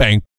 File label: PERC, Vocal, Jazz FX, BASS PERC